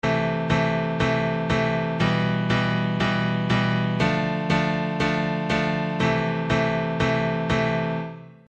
Am Em Dm Am

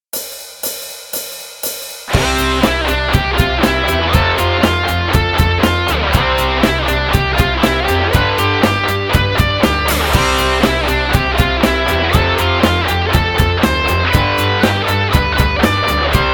Drum Kit Nice Recording Kit

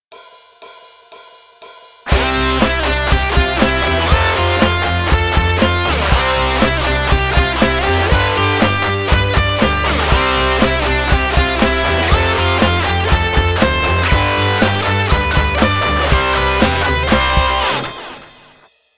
Drum Kit Groovin

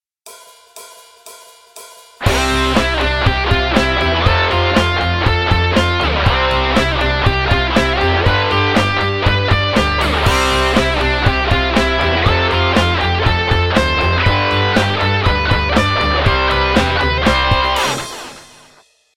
Drum Kit Re Pitched Kit

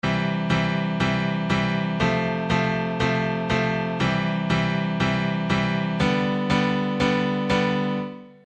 C F C G

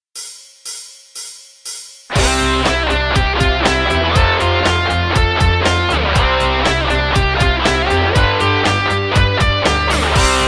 Drum Sampler

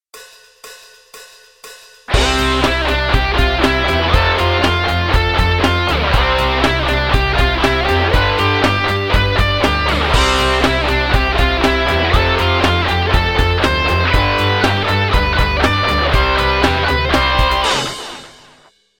Drum Kit Grinder

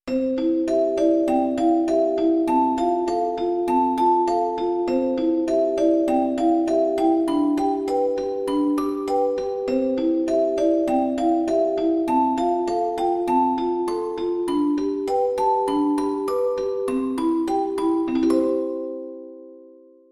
melo musicbox

